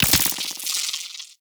Mortar Mine Drop; Warframe; Venus; Venus Terra Mortar Moa; Abilities

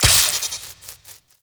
Vial Ability Poison Shatter; Warframe; Warframes; Alchemist; Elements

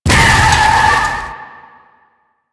bike damage

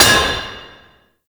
Worker Hammer Impact Metal; Warframe; Grineer

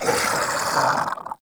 Flying Mouth Death; Enemies; Warframe; ManInTheWall

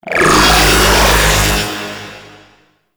Ground Laser Beam; Enemies; Weapons; Warframe; Tau; Sentient Dactolyst

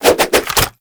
Nunchaku Quick Melee Return; Weapons; Warframe; Tenno

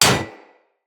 Bullet Impact Generic Enemy Shield; Weapons; Surface

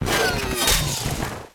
Rocket Turret Close; Venus; Turrets; Auto Agent Rocket Turret

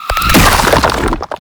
Horrors Charge; Warframe; ManInTheWall; Octopede

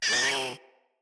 Vocal Pain; Warframes; Khora

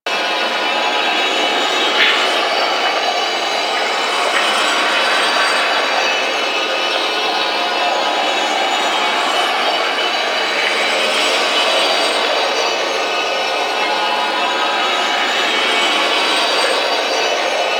Swarm Nest Stage Two Idle Loop; Entrati

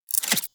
Reload; Warframe; Weapons; Zylok Prime

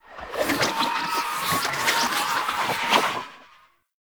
Blade And Whip Idle Spin Whoosh; melee; weapons; Warframe; Dagath Blade And Whip

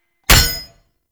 Pump Shotgun Clip Empty; Weapons; Warframe; Zariman

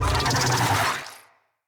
Infested Big Ashen Vocal Attack

